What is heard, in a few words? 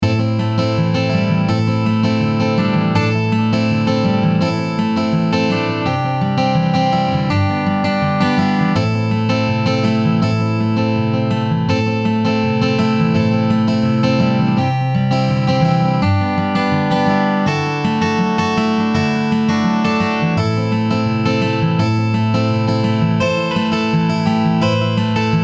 Nord Grand Acoustic Guitar